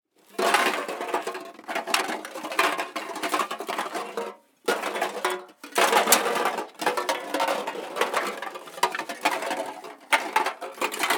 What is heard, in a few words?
Aluminum Cans